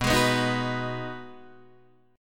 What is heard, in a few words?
B 6th B down Guitar Open D